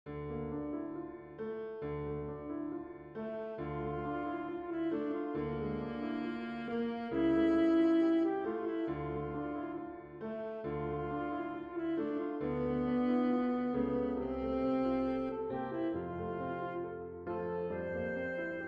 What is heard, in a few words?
Canzonetta, saxophone solo; Laub